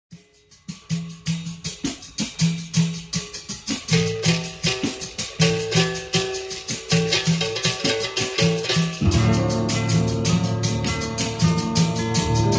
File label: bossa nova berimbau